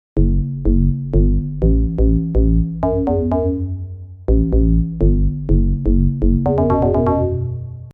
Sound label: Bass line and Response